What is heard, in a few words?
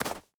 stag footstep snow hard; Rust